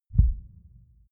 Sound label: synth low boom; whooshes rises; hits impacts